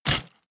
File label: step armor halfplate